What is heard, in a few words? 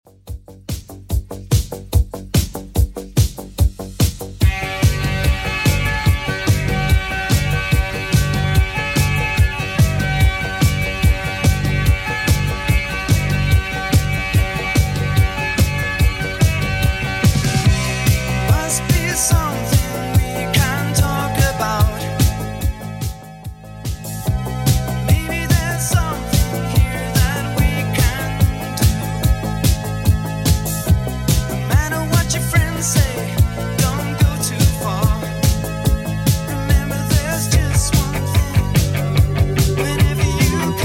80s Redrum 145bpm